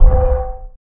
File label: Tube Kick; FREE SFX PACK VOL 3